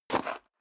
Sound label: step high heels gravel light single right; schritte; Footsteps; menschen